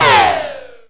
Shot

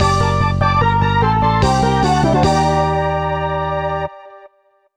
Dramatic; batbarian testament of the primordials

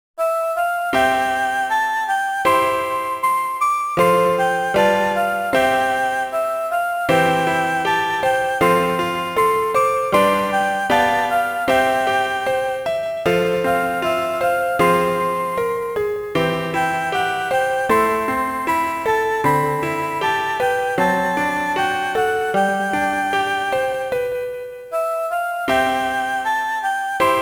Pokégear Radio - Poké Flute; Pokémon HeartGold; Pokémon SoulSilver

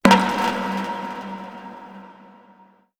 Se Sys Button Push; Onsen

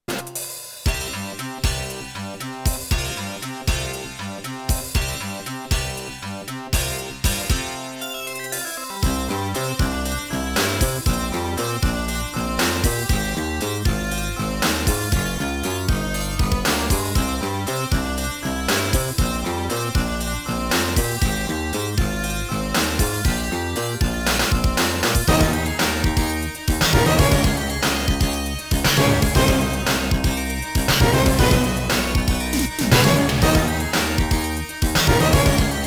Ending; Ajax; arcade gamerip

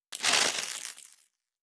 Air; Legend of Keepers

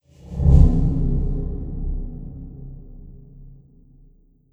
D R O P S A M B

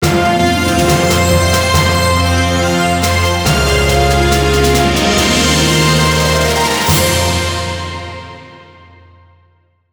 Boss Win; Dux

